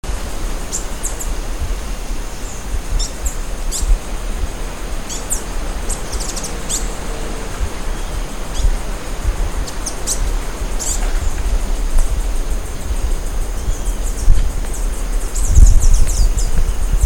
saira arco, Tangara seledon, Green-headed Tanager; Saíra Arcoiris

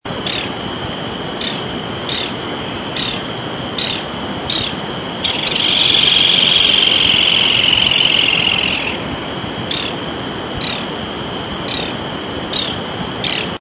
burrito comun, aves; cantos

